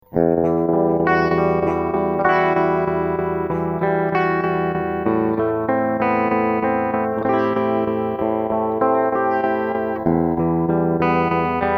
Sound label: Boss MD 200 Slicer